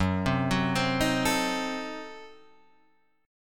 Gb Diminished 7th Guitar Standard; arpeggiated; JGuitar

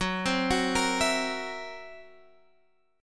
F sharp 7th Flat 5th F sharp

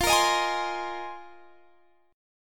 C Minor 7th Sharp 5th, F, guitar chord; Cm7#5/F strummed

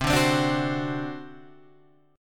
C Suspended 2nd Flat 5th C down Guitar Open G

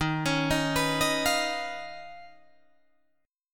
D sharp 7th Suspended 2nd Sharp 5th Guitar Drop D

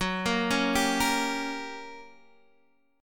G Minor Major 7th Flat 5th, F sharp

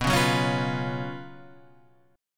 F sharp Major 7th Suspended 4th, B, chord

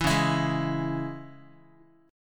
Db Minor Db Guitar; strummed; JGuitar

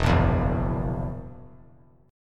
E Suspended 2nd Flat 5th E down; strummed; JGuitar

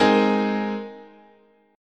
Ab Major Add 9th, guitar chord

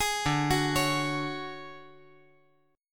D Major Sharp 11th D, chord

